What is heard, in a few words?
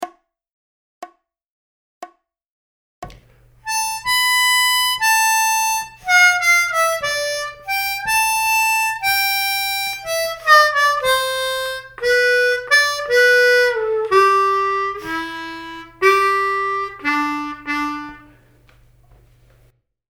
V IV I Licks 60BPM, harmonica